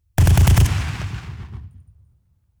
rifle ak-47 exterior fire burst bass

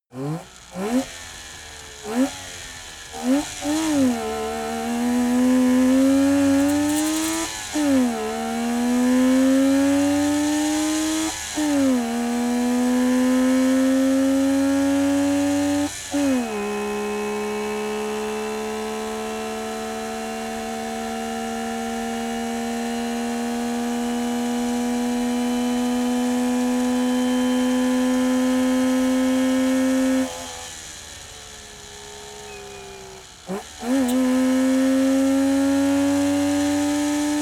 car saab 92 rev drive engine

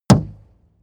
metal impact deep dull

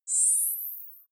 zoom out, signale

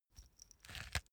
small pepper grinder glass ringing

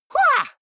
goblin fight shout attack; soundpool

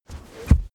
car armrest backseat close fast hard

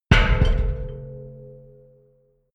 massive metal gas bottle low

